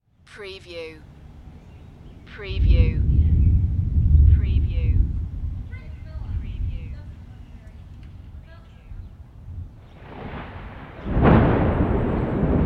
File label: Thunderstorm Rain